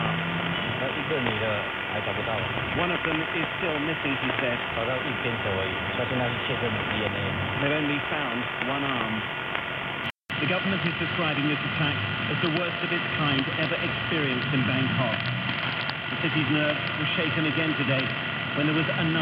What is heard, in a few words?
198khz BBC AM